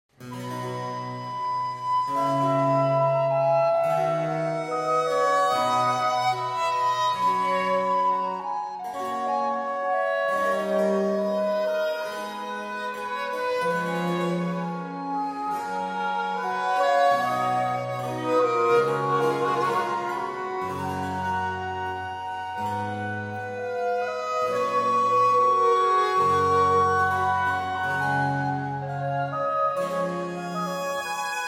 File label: Concerto TWV 43 a3 Adagio